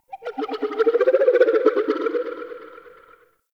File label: Ocarina; Warframe; Nokko Colony; Ambience